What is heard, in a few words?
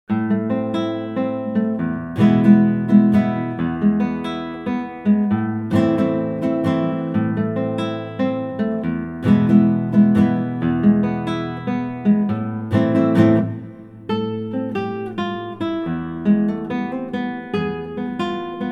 Salsa Casino schnell